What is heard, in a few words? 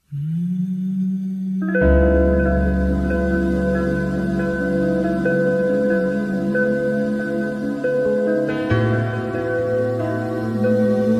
Lofi Song (Slowed Reverb)